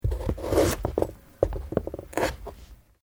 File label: Carrot cut; Food preparation; Restaurants